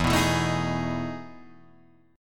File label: E Major 11th E down; EM11 strummed; JGuitar